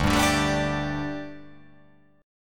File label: D Suspended 4th D, down